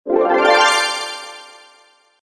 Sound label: sms; Clash of Clans